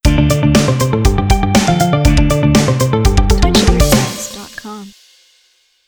Rock Sound Cheer

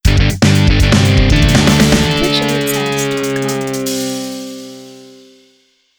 rock sound follower

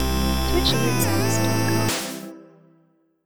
Retro Host

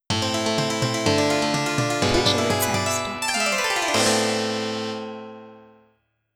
Medieval Donation

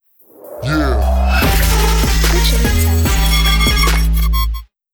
Trap Donation Sound

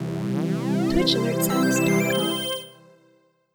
Retro Subscriber

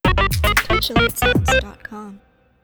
Simple Cheer

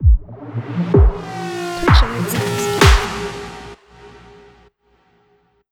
Dub Raid

